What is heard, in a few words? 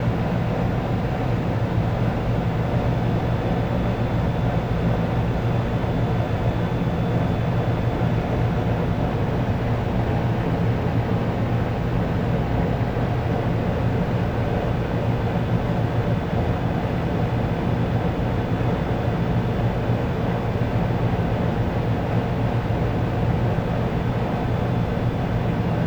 FAN Outdoor AC Unit, Constant Blow Drone Noise, LOOP; HVAC; Articulated Sounds; 100 Fans, HVAC, & Mechanical Drones